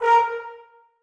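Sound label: trombones staccato a#3; Sonatina Symphonic Orchestra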